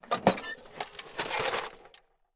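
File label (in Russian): скрип металлической ручки цинкового ведра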